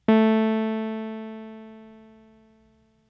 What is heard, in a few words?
Nord Lead 4 VCA Release long